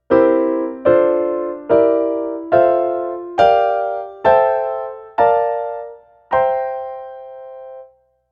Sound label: Piano Blocks